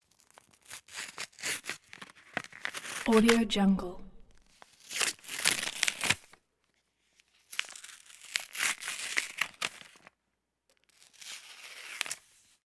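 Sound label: envelope close up opening tear apart paper rips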